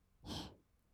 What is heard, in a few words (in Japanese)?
ひ, ブレス 吸う音; ASMR; 1200 kawaii voice!!